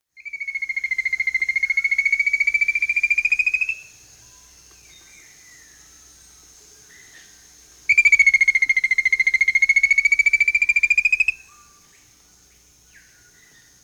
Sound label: Rufous-capped Antthrush (Formicarius colma), Peru; S. America